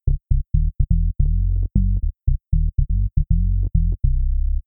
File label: bass whistle percussive attack